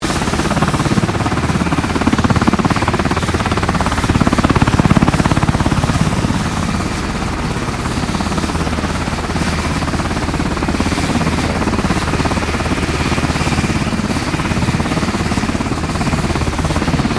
helicopter Sikorsky UH60 Blackhawk props idle loop; Vehicles